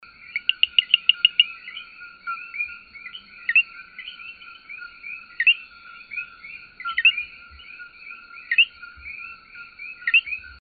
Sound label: E antillensis, coqui